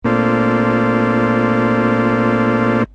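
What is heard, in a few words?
farfisa chord A sharp maj7; instruments single samples; Pads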